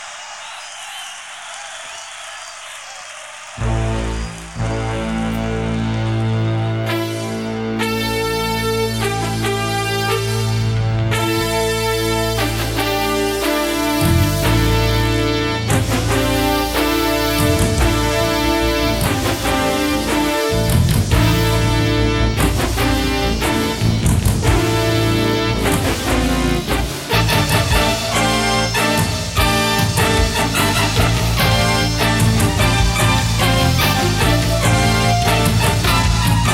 Instrumental Jam

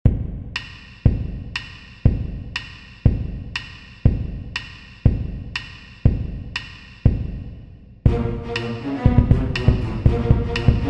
Hip-Hop Beats

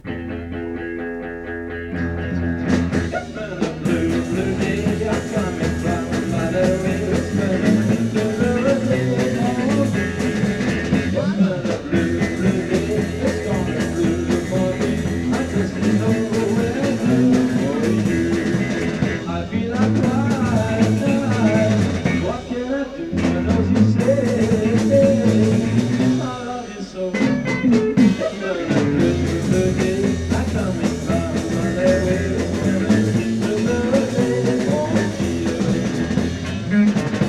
Strings

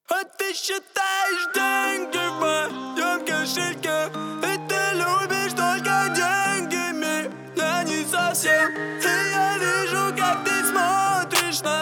37-39-41Hz low bass

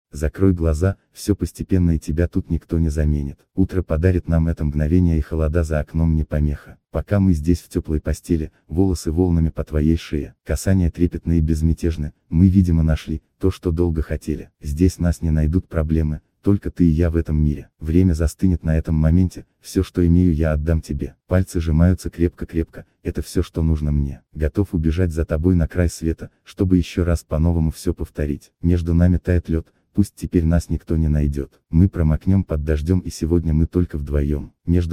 acoustic

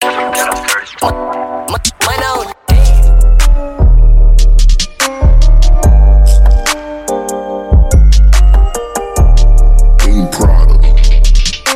SLOW REVERB nightcore

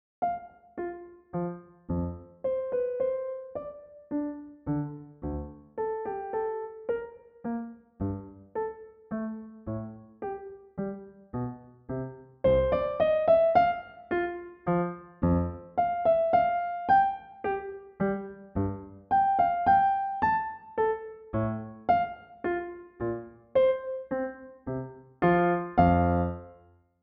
Frog dance; Oxford University Press Music

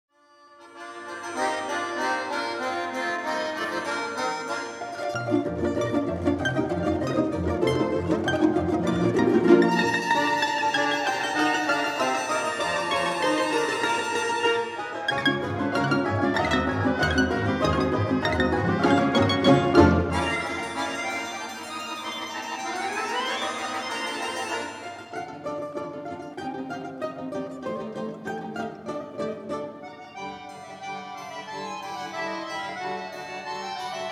Russian Folk Orchestra

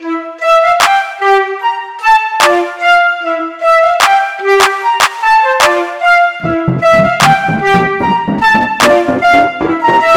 Beautiful Melody With Flute and Beats

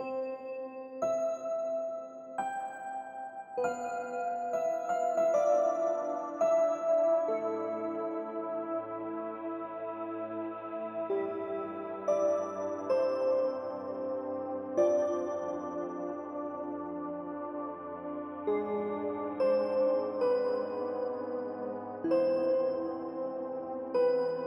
Calming Ambience

